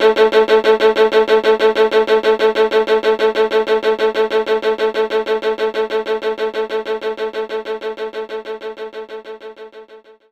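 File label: Violin Strike A3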